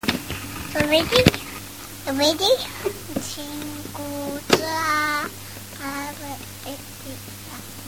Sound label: squeek, baby; 18mnths